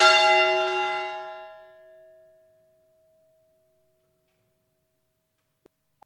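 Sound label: bell manipulation old style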